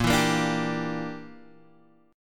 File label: Bb 6th Flat 5th, Guitar, Half Step Down